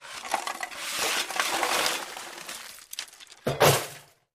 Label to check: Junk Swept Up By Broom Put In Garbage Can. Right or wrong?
Right